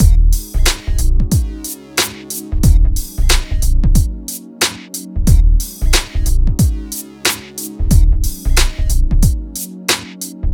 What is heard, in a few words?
MIX, Hip Hop and R&B Drumloops